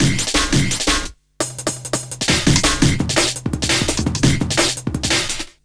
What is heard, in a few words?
Hardloop, Drum & Bass; Fluid Dynamics